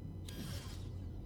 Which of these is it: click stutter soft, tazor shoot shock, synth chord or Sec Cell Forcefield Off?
Sec Cell Forcefield Off